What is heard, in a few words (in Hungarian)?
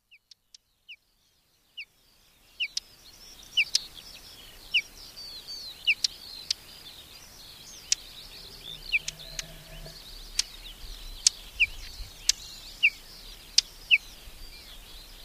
Rozsdás csuk; Madardalok